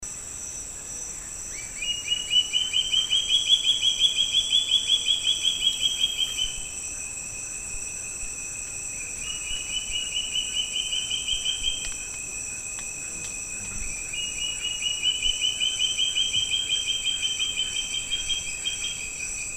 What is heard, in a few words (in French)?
epervier nain